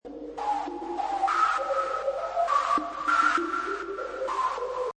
Space Scifi Sound Slow Modem, Strange sci-fi noise; Strange sci-fi noise generator or machine, white noise in echoey steps.